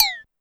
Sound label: Cowbell Down Fast, Pitch Env Various, Clavia DDrum; Clavia DDrum-AT